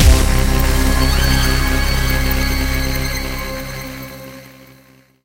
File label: stinger; Mirror's Edge (2008)